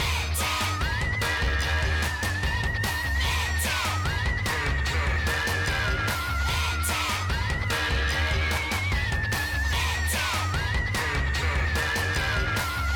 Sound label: MUSIC Theme LOOP; Ben 10; BEN 10 MT COMP